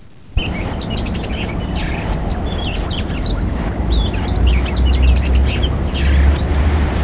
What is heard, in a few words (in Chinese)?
鵲鴝